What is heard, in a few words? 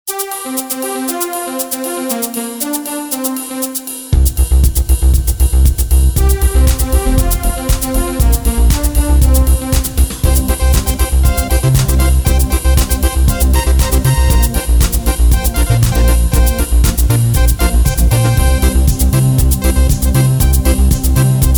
EuroBeat, Casio CTK-601 Rhythms